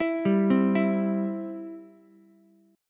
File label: C Major, C, down, Ukulele; arpeggiated; chord; JGuitar